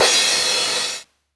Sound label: Jomox Cymbal